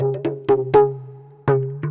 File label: PERC LOOP ETHNO 125